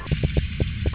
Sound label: Melanogrammus aeglefinus Juvenile Sound